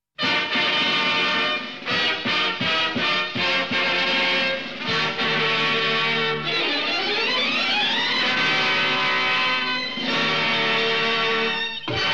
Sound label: Theme (Instrumental)